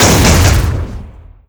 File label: tau explosion charge; tf2classic